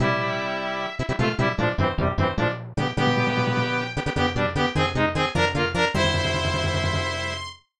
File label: World Clear; SMAS; SMB3